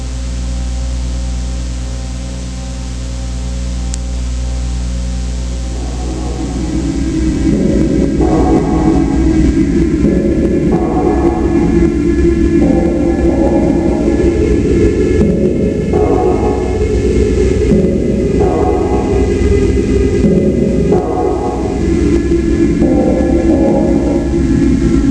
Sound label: amb